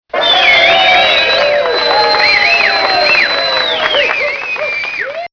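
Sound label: tolpa, crowd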